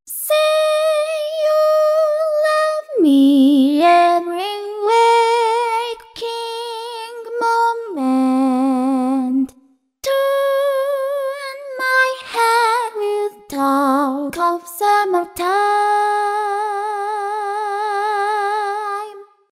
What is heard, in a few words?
vocaloid